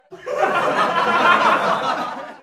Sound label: sitcom crowd laughing